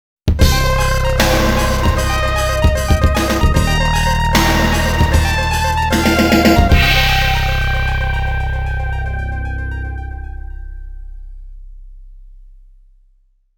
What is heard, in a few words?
Mission Clear; Mission 4 Clear; TAITO SOUND BULLETS (2025)